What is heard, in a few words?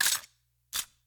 nailgun load